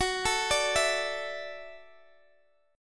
F sharp Minor 7th Sharp 5th F sharp, Guitar DADGAD